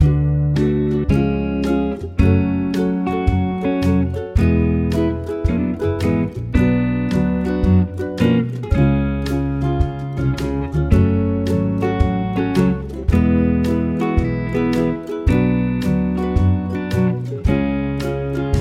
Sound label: minor key chord triples, F sharp; harmony